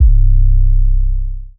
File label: 808 C; Cymatics; Oracle Sample Pack; 808s